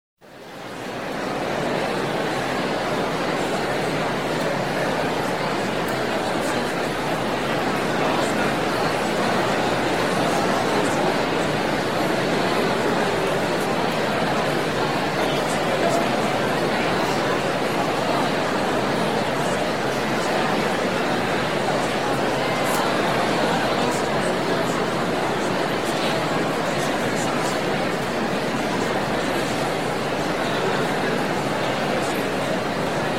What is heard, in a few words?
Expectant Crowd Noise; SOUND EFFECTS1